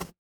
retro game hit

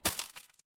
impact crate break

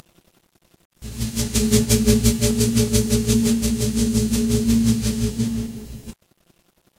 mechanical machine

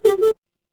ui power on

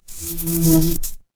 animal insect

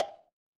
instrument wood block

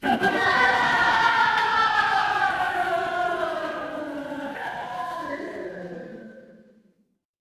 crowd laughter

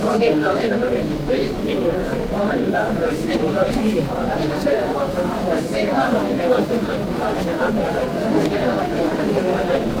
crowd murmur

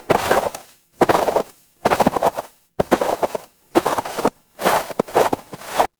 footsteps snow

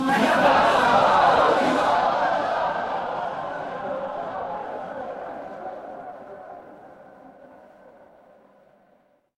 crowd gasp

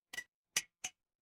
glass ceramic

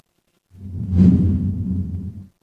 transition swoosh

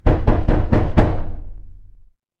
door knock

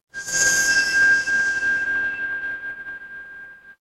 instrument chime bar

